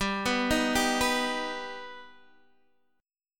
G Augmented G, Guitar Standard; G+ arpeggiated; 15,14,13,12,12,x

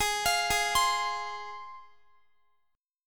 G sharp 6th G sharp down Guitar, chord

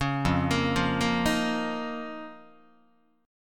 F 7th Suspended 4th Sharp 5th F down Guitar; F7sus4#5; arpeggiated; JGuitar

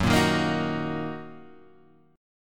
Fsharp 6th down Guitar Half Step Down

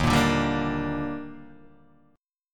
Eb Major 7th, Guitar Open D; 1,1,0,1,1,0; down

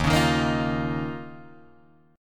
D sharp 7th Suspended 2nd, Guitar, Drop D

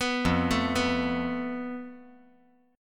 F Major Flat 5th

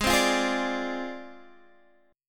G sharp 6th Flat 5th, Guitar, DADGAD; x,11,10,10,11,12; down; chord shape

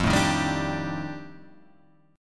F 13th F, chord; F13 Chord {1 0 1 0 3 3}; strummed

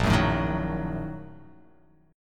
A sharp Major 7th Suspended 4th Sharp 5th

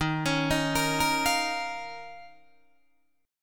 D sharp 7th Suspended 2nd, Guitar, Open D

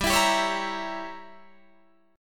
G Diminished 7th, Guitar, Drop D

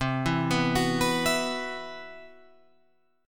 C Major 7th Flat 5th C down Guitar Open F; arpeggiated; CM7b5

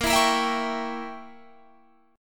Bb Diminished 7th Bb Guitar Standard; strummed; JGuitar

